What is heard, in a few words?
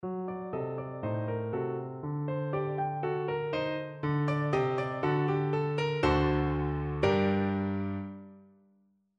g minor, elaborate cadences; dressed up perfect cadence; For this project I was asked to write four examples of a dressed up perfect cadence.